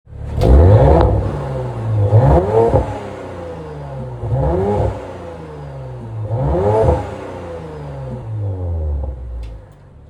RS4 revs, audi; edition 25 years imola yellow

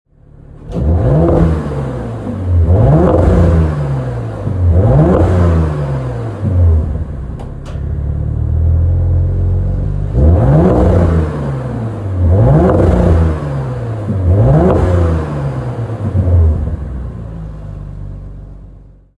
Revs, audi rs4; carbon black tango red

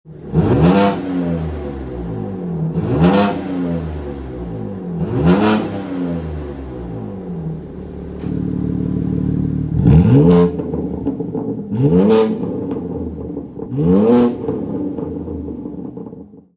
m3 touring revs, bmw m3 touring; tom wrigley tuned

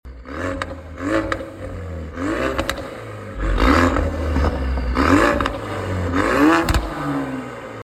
ford focus rs red edition moutune modified reving; 1 of 300 race red

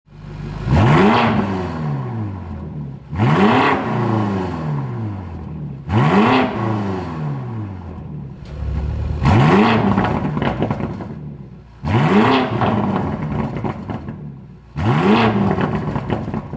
M5 G90 revs, bmw; autoid build midnight purple

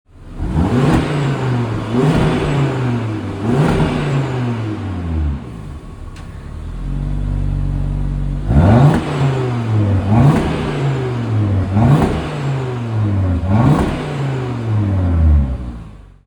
rs3 revs, audi rs3 8y; porsche nato olive green; audi exclusive paint

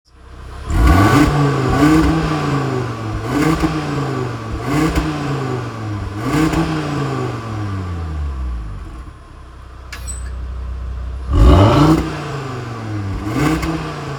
kyalami ttrs revs, audi ttrs vorsprung coupe; kyalami green rs sports exhaust

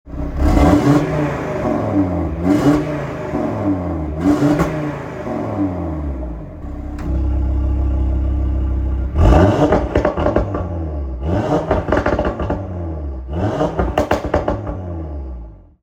TTRS revs, audi ttrs 8s coupe 2 5tfsi; quattro s tronic panther black crystal effect unitronic stage 2 620ps

